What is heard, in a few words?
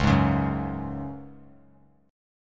Db Suspended 4th Db; strummed